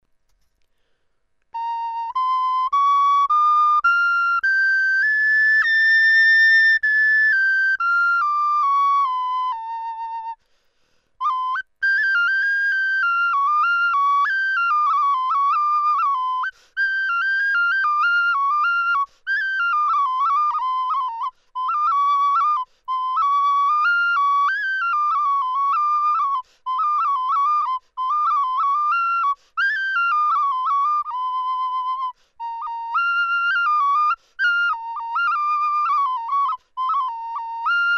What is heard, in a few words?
red deer flute